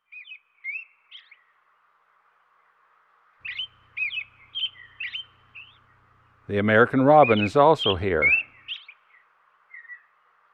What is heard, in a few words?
American Robin; Western North America; Dan Gibson; Wildlife Identification By Sound